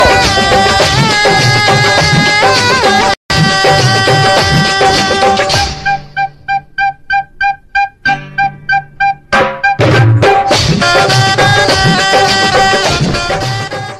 funny music ringtone

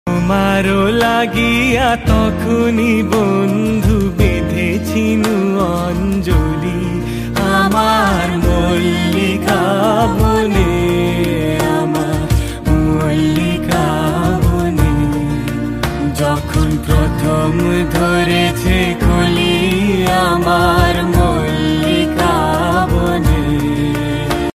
rabindra sangeet ringtone; bollywood

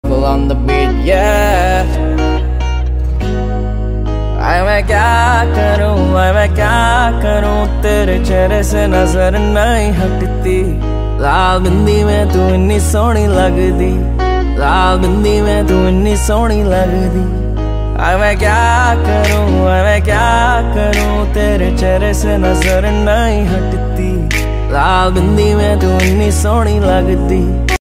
punjabi song ringtone; bollywood

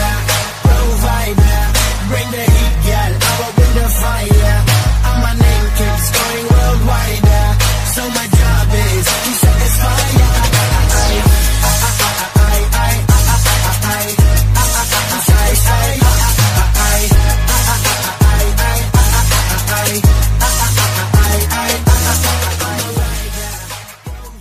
new popular ringtone, english ringtones

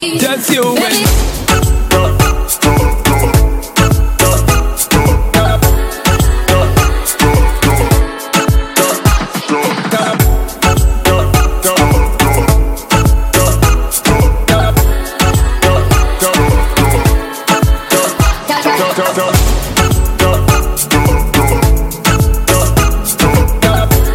trap ringtone

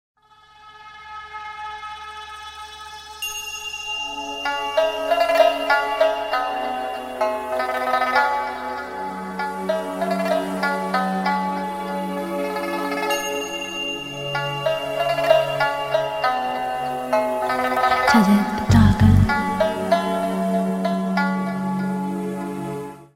meditation ringtone; samsung

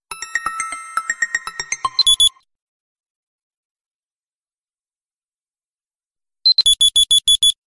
sms tone ringtone

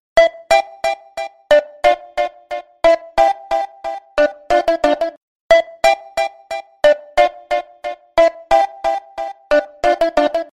china tone ringtone

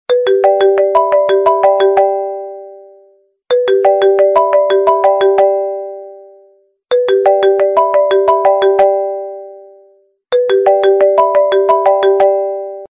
i phone tune ringtone

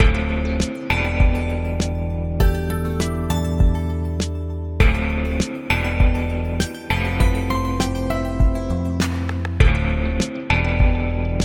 light town ringtone, apple

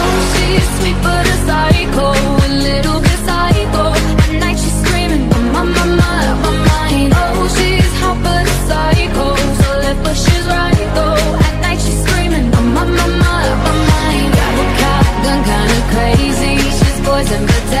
ringtone, english ringtones

